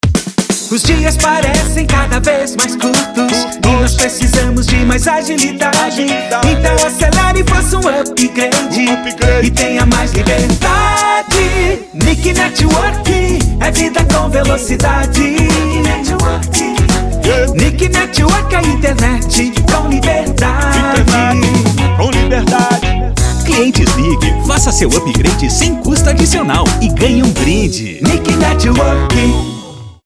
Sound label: Jingle